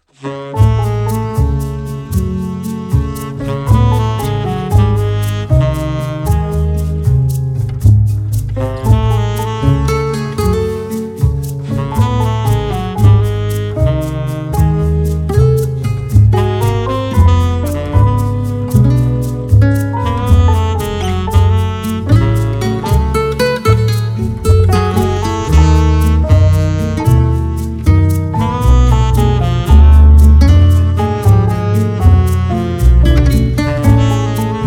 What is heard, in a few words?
bossa